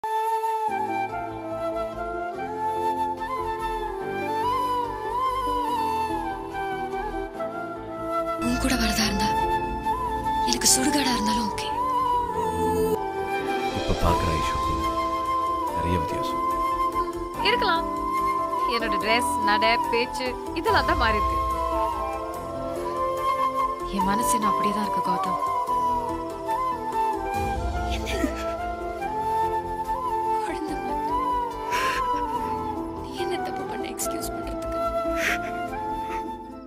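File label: tamil love background music; sillunu oru kadhal; gautham aishu scenes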